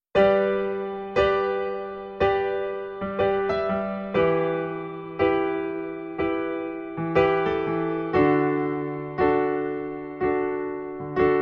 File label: AP FULL GRAND SWEET